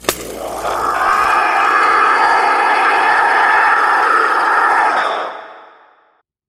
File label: Light Bulb Throw; Lights